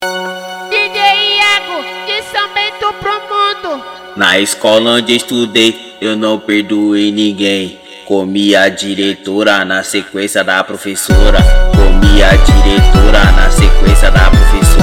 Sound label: beat